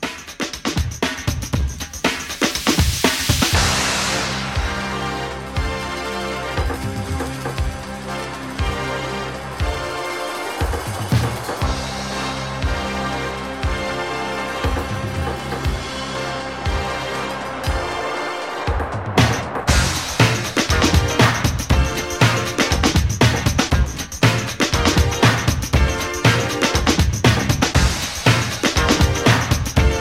80s Redrum; Remixfreakz

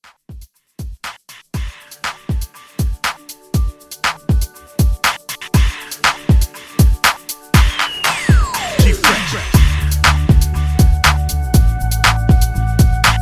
Dance Re Drum 120

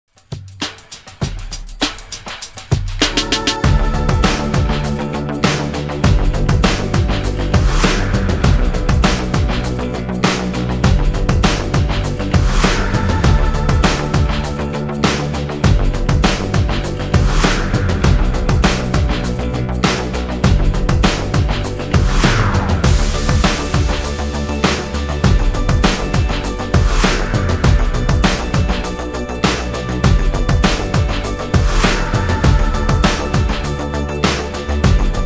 EXTENDED 100 BPM; REDRUM

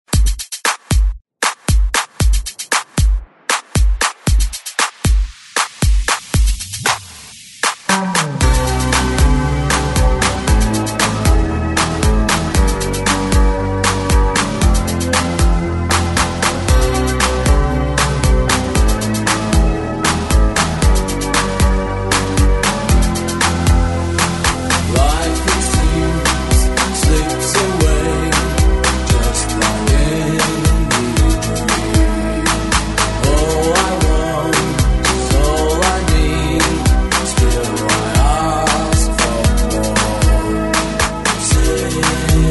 Redrum 116 BPM

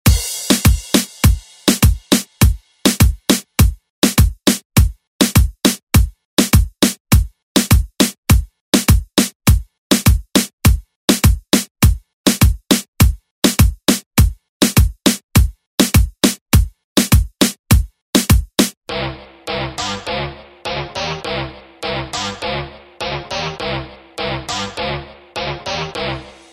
Latin Urban Redrum; Deejay Hit

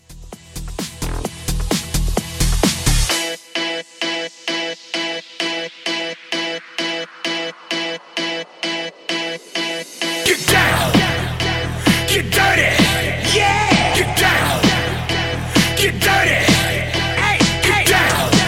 Hype

